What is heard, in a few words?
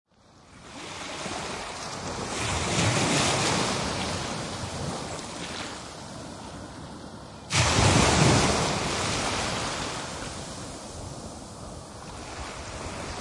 waves atlantic ocean surf crashes close up beach; pei